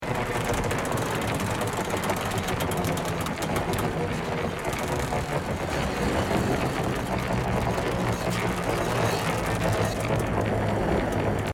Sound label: Creaky old boat